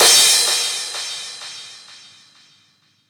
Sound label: Crash, Cymbals; Vengeance Samples; VEH2